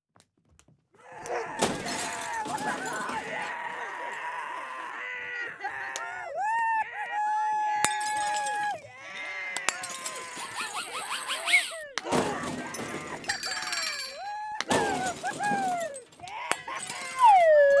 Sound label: comical brawl; Cartoon - film effects